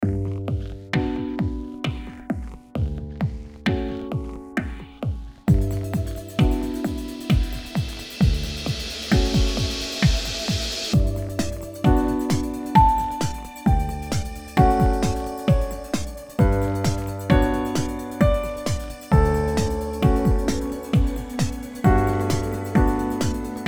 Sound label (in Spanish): gymnopower con piano